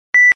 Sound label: Low Beep Once; LG enV2